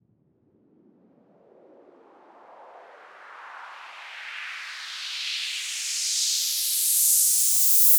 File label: long white noise sweep